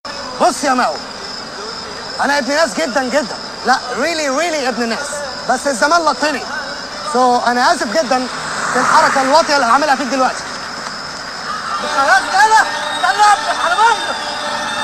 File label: Taxi